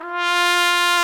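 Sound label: brass, Tpt Solo; Bach; Roland L-CD702; Tpt 1-4 Solo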